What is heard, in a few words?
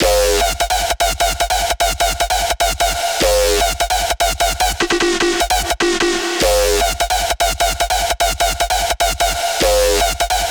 Drop Loop, 150 BPM D# Min, Dubstep Bass Loops; Synths; Cymatics; Dubstep Starter Pack